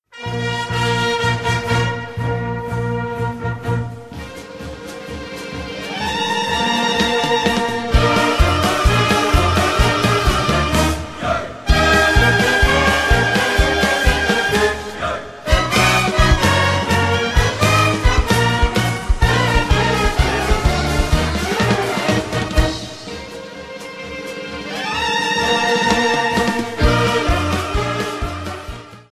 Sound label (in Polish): Suita kaukaska